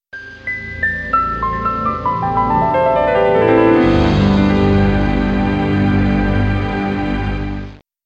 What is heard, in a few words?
ringtone; warner